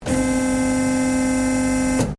SERVO PLATFORM LIFTER OPERATING, Servo to operate loading bay lift platform; Machinery